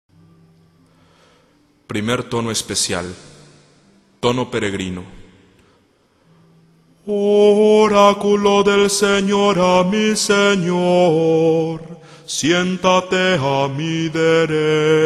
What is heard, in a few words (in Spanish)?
tono peregrino Entonacion de los salmos, a capella